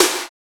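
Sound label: WIDE SNARE; Drums In Your FACE!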